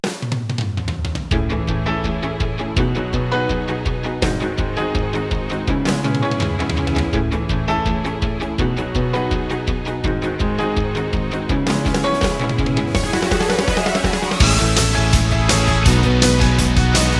Tema principal Instrumental; enraged red ogre

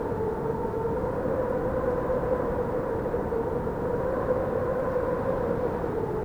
Viento; el chavo una feria en la vecindad; ios mobile gamerip 2012

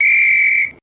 Pitido Inicial; el chavo una feria en la vecindad; ios mobile gamerip 2012

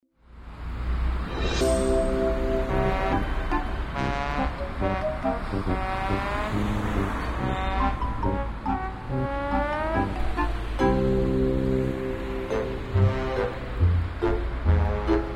Música De El Baldio (Con Ambiente); El Chavo: Una Feria en la Vecindad

